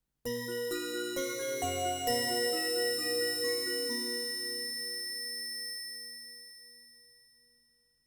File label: chime